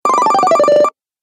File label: Retro Videogame Fx Level Bonus; Twistedtracks